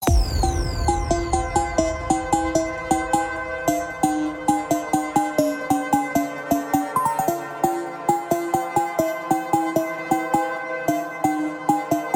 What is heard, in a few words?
Dream Bell